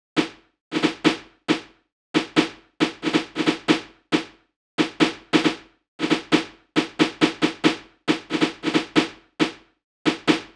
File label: Snare Loop